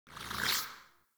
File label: Tenno Weapon Show; Warframe; Weapon Visibility